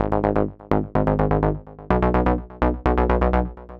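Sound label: Electro Pulse Synth bass, 126bpm; Zero-G; Soundclash; Combi Loops; Nu Dance Electro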